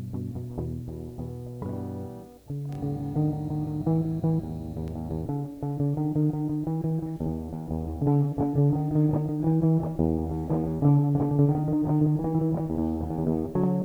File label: guitar